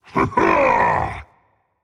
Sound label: yay rage; chaac